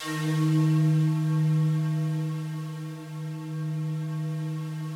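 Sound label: FOG ATTACK D#2 Left, Yamaha VP-1; Music Store; 90's Sample CDs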